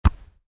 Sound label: Volleyball hit soft, Volleyball (Exterior): Hit Soft; Sports; Miscellaneous Sports